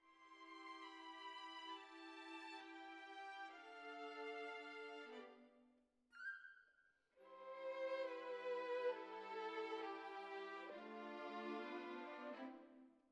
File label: Violins Over